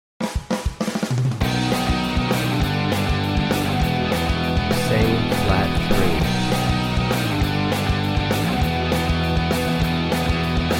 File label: Alto Key of B; punk music ear training; Practice Perfect